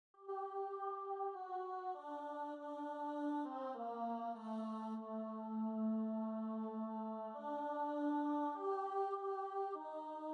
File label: choir